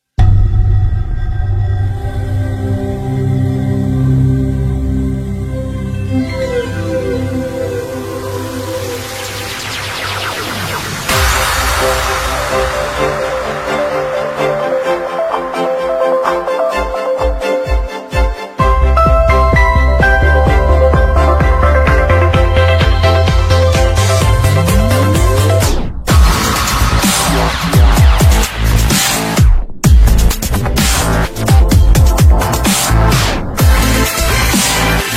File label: Dubstep, ringtone; Samsung